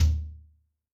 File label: Brsh Rk Fl, Tom, Yamaha DTXpress-II